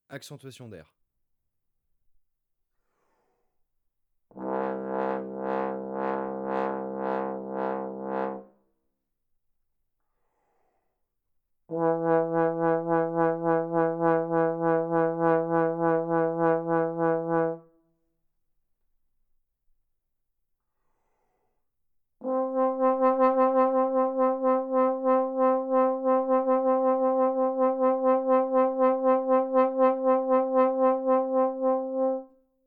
accentuation d'air, trombone ténor, Effets, Ondulations vibrato; traite orchestration

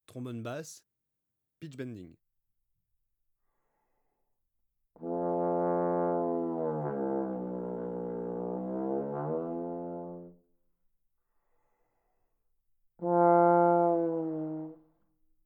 basse pitch bending, trombone; Effets; Deformations du son; traite orchestration

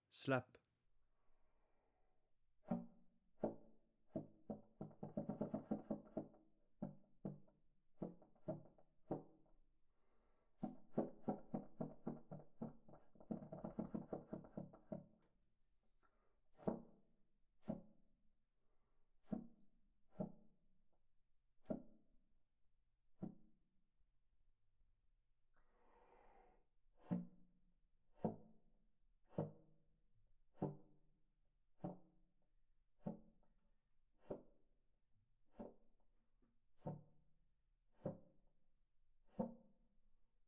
slap, trombone; Effets; Percussions; traite orchestration; trombone tenor trombone basse

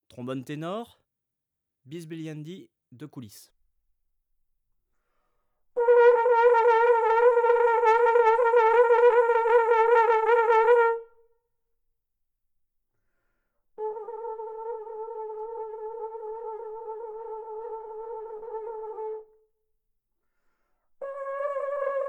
ténor bisbigliandi de coulisse, trombone; Textures; Sons granules sonores; traite orchestration

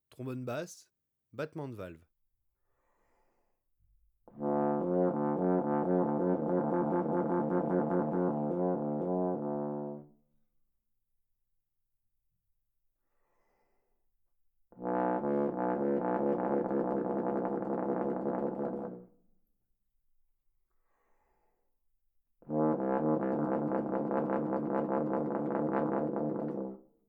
basse battements de valves, trombone; Effets; Trilles battements; traite orchestration

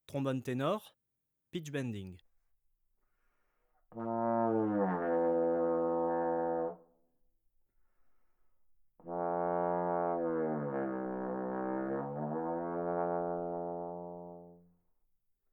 ténor pitch-bending, trombone; Effets; Deformations du son; traite orchestration

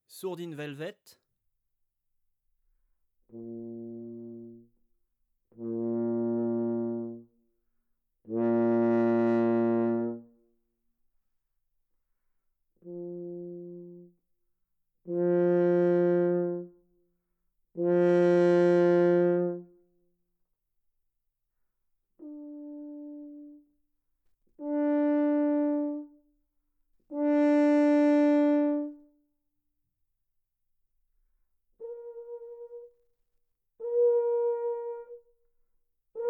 sourdine Velvet, trombone; Sourdines; Sourdines fixees; traite orchestration; trombone tenor trombone basse

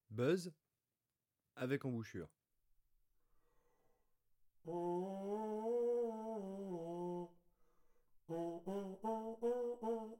buzz avec embouchure, trombone; Textures; Sons complexes faibles; traite orchestration

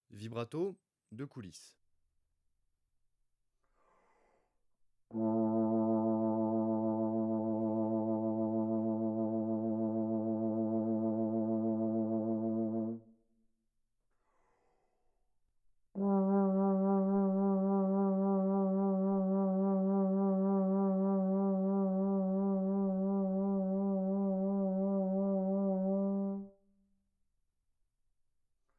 vibrato de coulisse, trombone; Effets; Ondulations vibrato; traite orchestration; trombone tenor trombone basse

